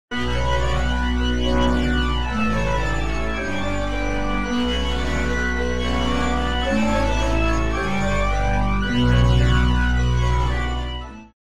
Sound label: Rotary Speaker Gritty Tint Organ Wet; AmpVibe; Syntheway